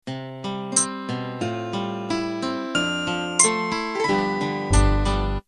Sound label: 8Beat slow 70s Intro